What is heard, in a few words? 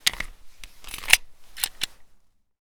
USP Unload; zps; Weapons; Firearms